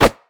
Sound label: great wraith basic attack; LoL; monsters